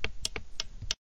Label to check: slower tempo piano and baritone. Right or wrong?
Wrong